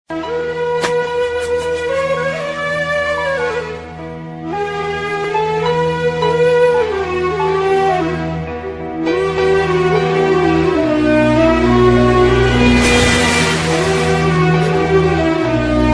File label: sad bgm